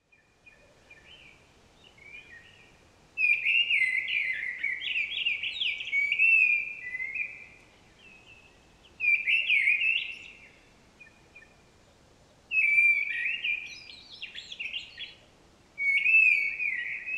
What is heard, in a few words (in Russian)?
deryaba turdus viscivorus; peniya ptits